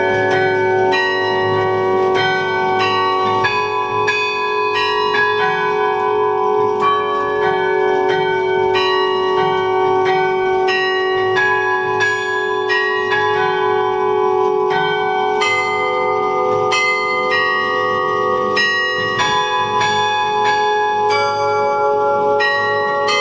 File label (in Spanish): Sonido campanas carrillon de seis campanas; Ave Maria; Parroquia San Nicolás de Tolentino